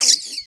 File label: alien; creature